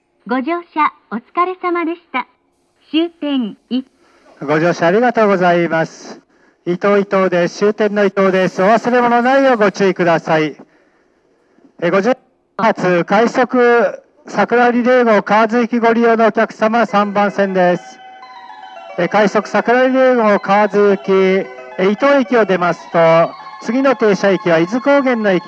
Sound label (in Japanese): 発車メロディー 5.1コーラスです!; 臨時快速さくらリレー号で収録しました。